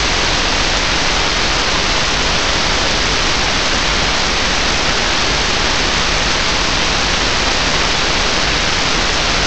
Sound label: a signal dem